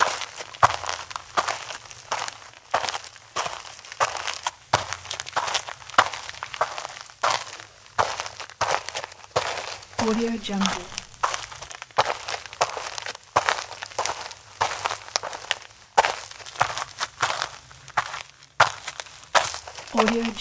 footsteps human walking gravel steady rubber soled loop; blazznet